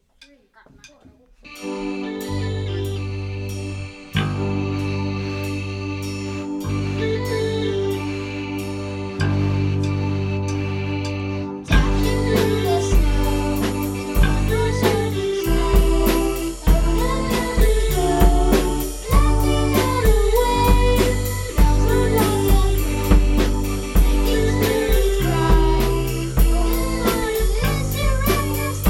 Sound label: Echo Jingle Bells